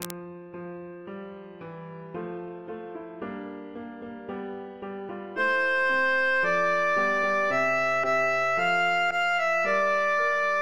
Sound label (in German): sopran